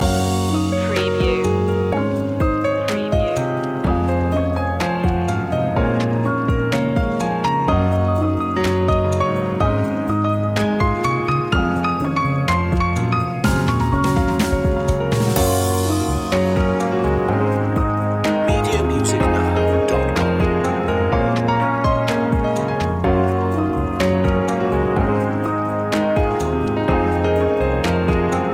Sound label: thinking no guitar loop; media music now